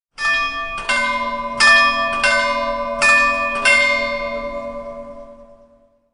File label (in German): Bahnhofsglocke Poschiavo